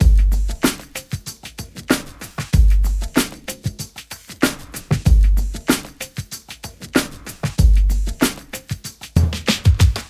ABORIGINL BREAKB R; Spectrasonics

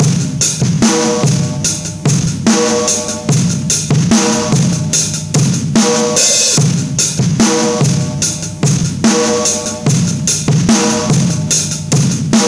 73 live breaks

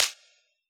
whip; AnlgKit1

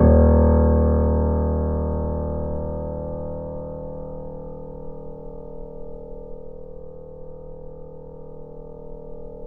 PP D#0 off, Grand Piano off mic; AKAI S6000 CD-ROM - Volume 2

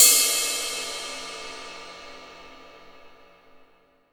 22INCH ZIL RIDE, Ride Cymbal

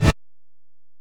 Bell, Alesis DM-PRO

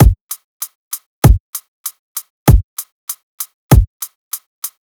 FK BEAT, FUNK; USB Soundscan vol.47; AKAI

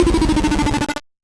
Noise A, 8-bit, Bitty Noises

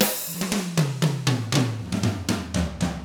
Drums, R N B 6-8; ILIO; Double Platinum Drums 1

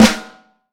FLAM, Vintage Snare Drum; Q Up Arts; Johnny.C's Vintage Snare Drum; 5X14 LEEDY

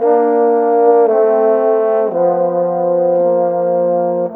Horns, Orchestral, Rock Pop; Sonic Foundry (Sony Creative Software); Orchestral Series VOL-4 - Rock and Pop

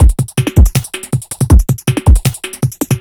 Beat, Industrial

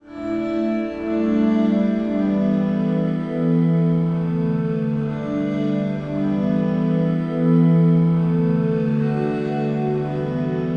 Scree Cycle, Acoustic Drone; Sonic Foundry (Sony Creative Software) - Friendly Fire; DroneLoop

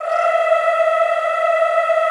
E4, Extended Classical Choir; Best Service

